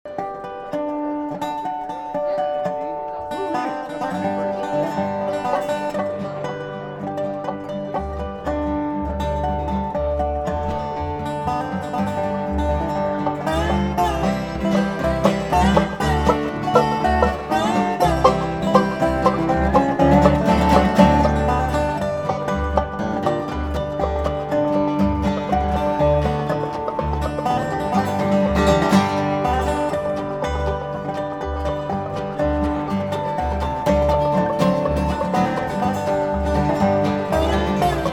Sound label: Dobro Instumental